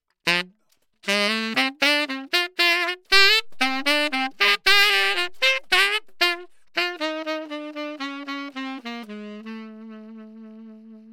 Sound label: saxophone, tube; lewitt